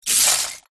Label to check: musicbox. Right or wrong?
Wrong